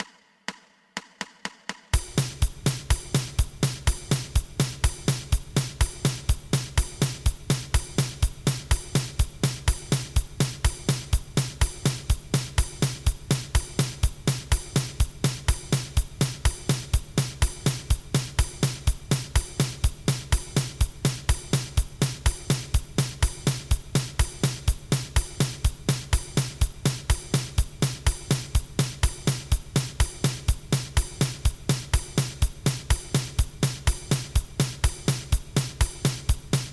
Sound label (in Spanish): 2 pulsos